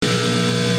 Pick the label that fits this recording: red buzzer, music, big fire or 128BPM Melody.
red buzzer